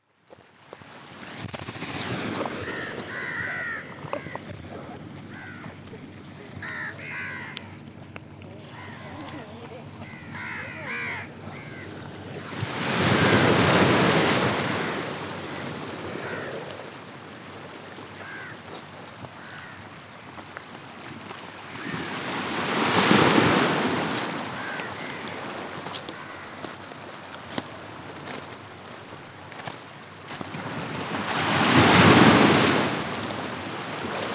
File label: Ambience; Laya Project Ambience Vol 1